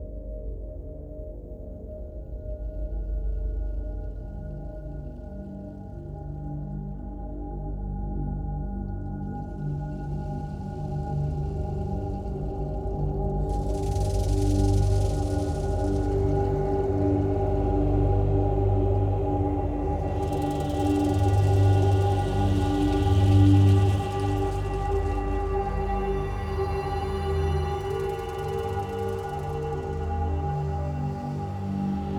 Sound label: Ice ambient; Datura (PS3) (gamerip) (2012)